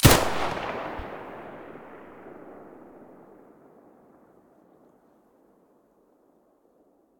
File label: muzzle mp9 stereo, weapons